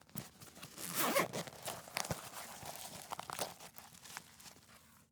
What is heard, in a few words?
Sphygmomanometer, Medical; Blastwave